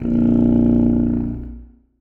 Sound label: contrabassoon a#0; Sonatina Symphonic Orchestra